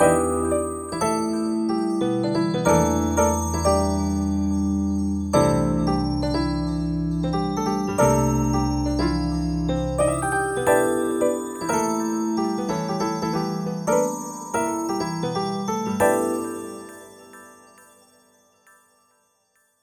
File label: Piano Bells